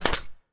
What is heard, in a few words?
shake light